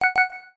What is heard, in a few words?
Click